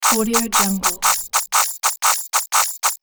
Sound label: armored robot move